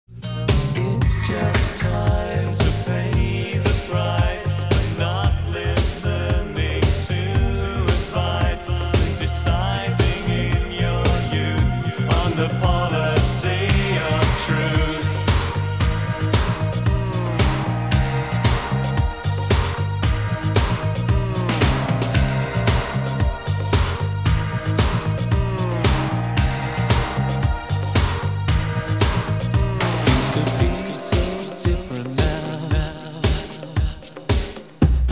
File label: beatbox